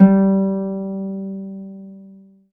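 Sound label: HARP A4 SUS; ILIO; Synclavier Strings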